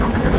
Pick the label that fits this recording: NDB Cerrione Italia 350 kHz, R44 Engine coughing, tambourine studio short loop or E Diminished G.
R44 Engine coughing